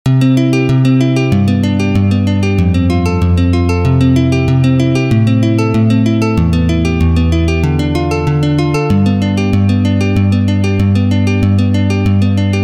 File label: Chord Arp